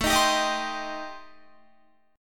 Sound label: A-Minor 7th Flat 5th, Guitar, chord; Am7b5; strummed